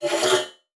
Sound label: Multimedia Internet CD-Rom Flash Hi-Tech